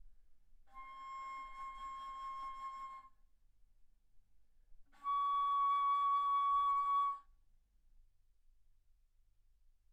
Bass Flute C6 Db6; ff

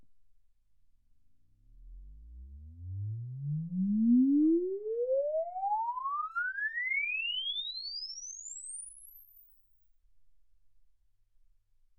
exp swept sinus 10Hz 24kHz

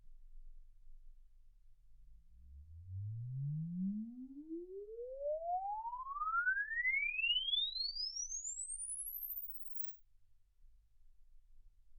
exp swept sinus 10Hz 24kHz

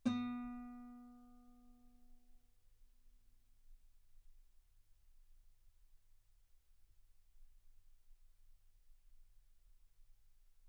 Guitar ff B3

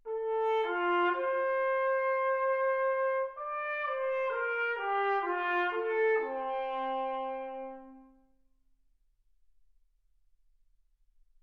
trumpet

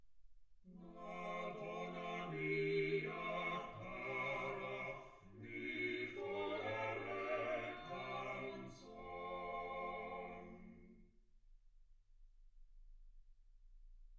quartet

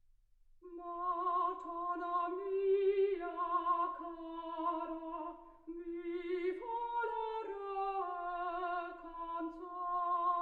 soprano

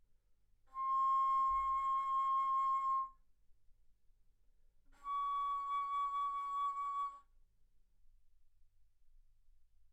Bass Flute C6 Db6; ff

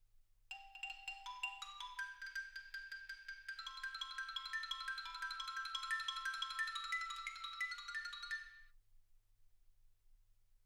xylophone

